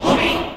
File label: Menu Horror